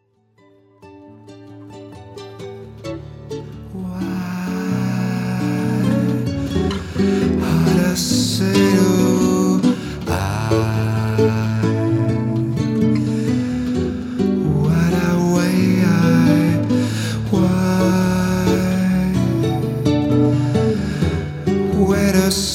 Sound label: Samba Emotion